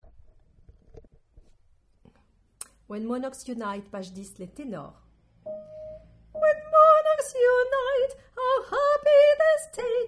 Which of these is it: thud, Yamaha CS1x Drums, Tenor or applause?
Tenor